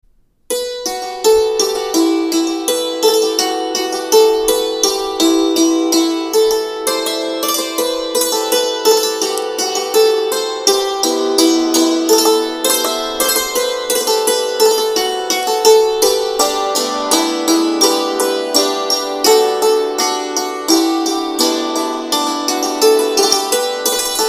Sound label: Dulcimer; Tetris